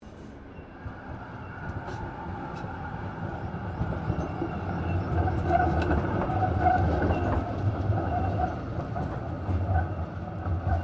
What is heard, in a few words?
Tram Melbourne Z3 Class Exterior Passing; Melbourne Trams; Streetcars; Sound Effects:Vehicles